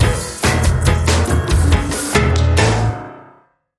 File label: Cuban Cacophony, Sting